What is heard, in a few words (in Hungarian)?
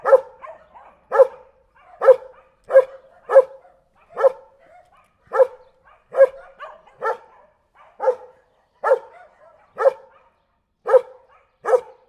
barátságos ugatás, németjuhász, házőrző; Perkupa